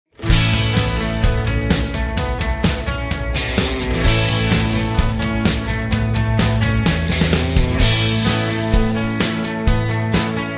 (A) No Backing Vocals

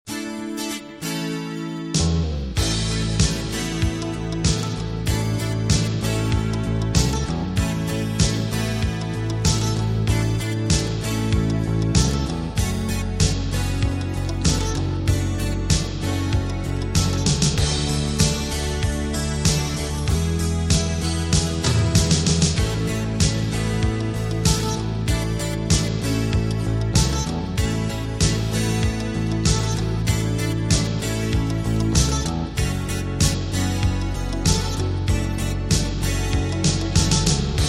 A, backing tracks

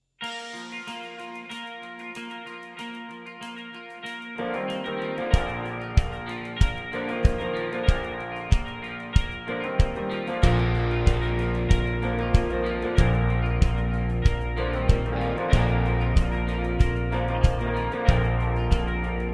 Joy To The World (Bb To C) No Backing Vocals

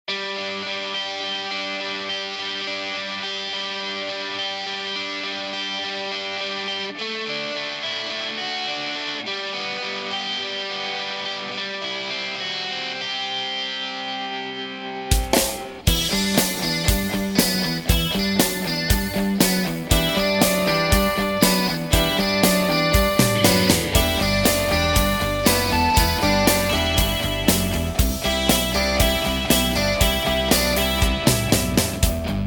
G No Backing Vocals, backing tracks

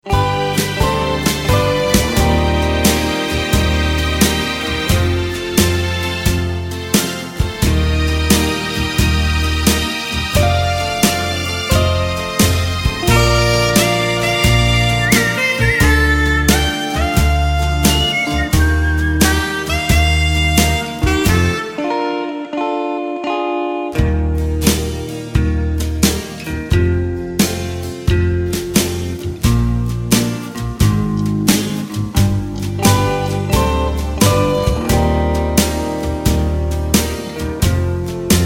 No Backing Vocals

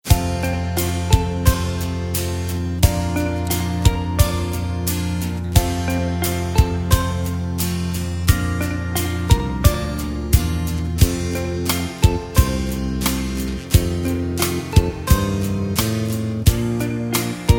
F No Backing Vocals, backing tracks

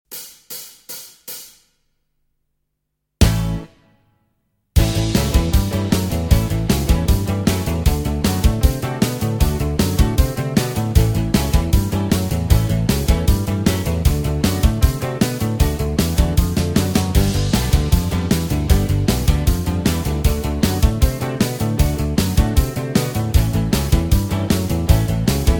D No Lead Guitar, backing tracks

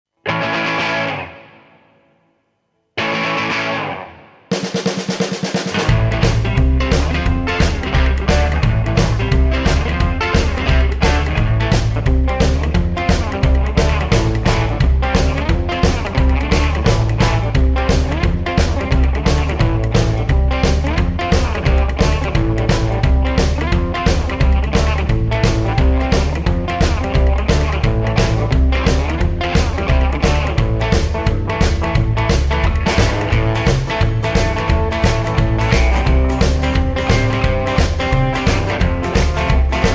(Am) No Lead Guitar No Backing Vocals

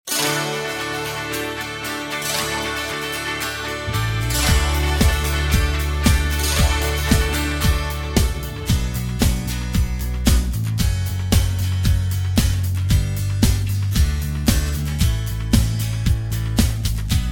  (D) No Backing Vocals

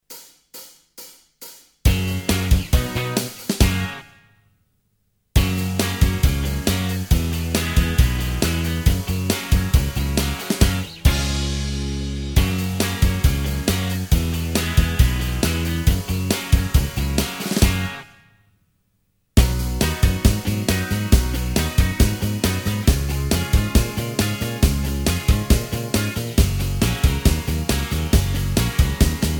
(Gb) No Lead Guitar

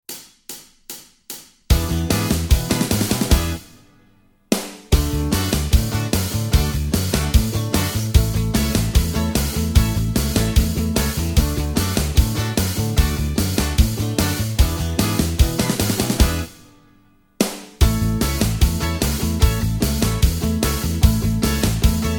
A No Lead Guitar, backing tracks

